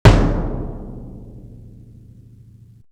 Tom, Drum One Shots; Coldcut's Kleptomania VOL1

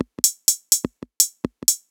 Hats 125, Perc Loops, Old Skool House

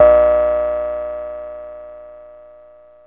SYNTH BELL; ANALOG BELLS; Ambient; Zero-G; Ambient 1

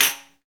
TAMBO, Tambourines

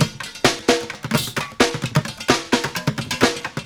Street Groove, Ambient Grooves

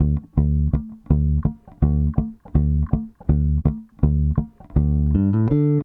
Soul Bass, 70s Analog Funk

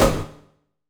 HIT 4 FX; Bolder Sounds - Granular

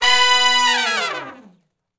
Long Falloff (B) unison, Horncraft; Sections

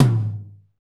tom R&B, funk kit, Roland; Northstar; Drumscapes Roland; Funk Kit 2

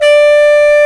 Bs Clarinet, Orchestral Winds; Roland LCDP04 Orchestral Winds; Lo Clarinets

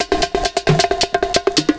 PERCS, 70 s Breakbeats

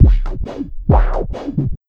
LOW 135 FX, Big Beat

